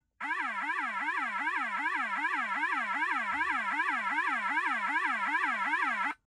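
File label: Yelp, emergency vehicle safety warning; SUBTONE; 911 Signal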